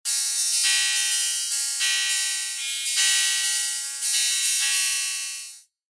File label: drum, virtual membranes